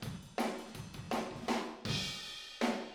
Take Your Toms Off Fill Room ST, Drums; Sonic Foundry (Sony Creative Software); Drums from the Big Room The Mixes; Demo Projects

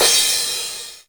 909 Cymbal; 300 Drum Machines

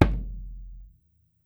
SURDO; E-MU Producer Series Vol. 7 – Old World Instruments; World Combos

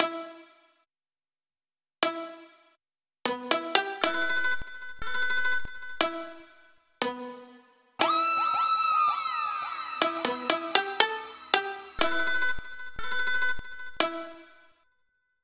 Melody

